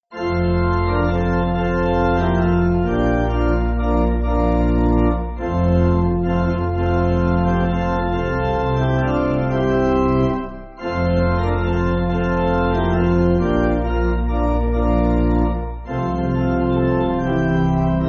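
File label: Pipe Organ, church music; Where Are You From Heaven; Hasty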